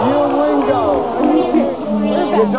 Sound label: lose